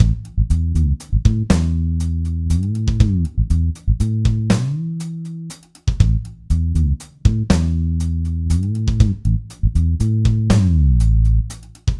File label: guitar bass 3/4; bass-pack